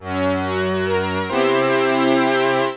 Scifisynth; Fanfare; 1997 MMX LAN PACK (win 95); Worms Armageddon

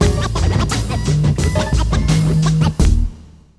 Scratch; 1997 MMX LAN PACK (win 95); Worms Armageddon

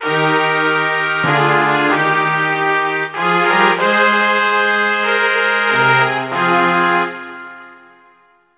Austria, Fanfare; 1997 MMX LAN PACK (win 95); Worms Armageddon

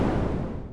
Jet Pack Finish

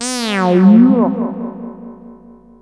Silly, Fanfare; 1997 MMX LAN PACK (win 95); Worms Armageddon

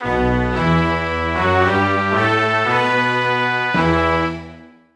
Rumania, Fanfare; 1997 MMX LAN PACK (win 95); Worms Armageddon

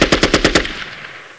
sarge heavy mg; 1997 MMX LAN PACK (win 95); Army Men

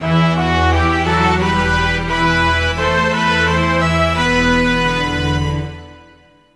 Israel, Fanfare; 1997 MMX LAN PACK (win 95); Worms Armageddon

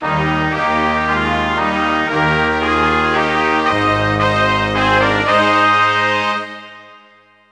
Wales, Fanfare; 1997 MMX LAN PACK (win 95); Worms Armageddon

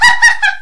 laugh; Worms Armageddon; Finnish

